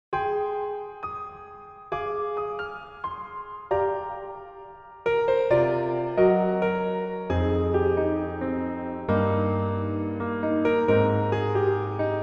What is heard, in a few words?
Piano MR